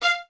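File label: Violins F 5 staccato right; Miroslav Vitous; String Ensembles; 11 Violins; 11 VS Stacc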